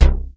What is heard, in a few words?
HARLEM C3, bass; Q Up Arts; Dream Experience